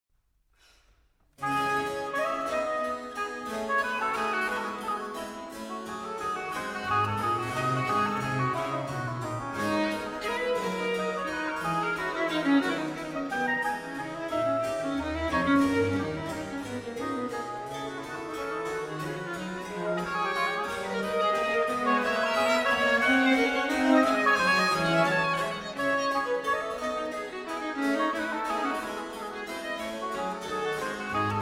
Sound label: Trio Sonata in D Minor Vivace for oboe, violin and basso continuo; BWV 527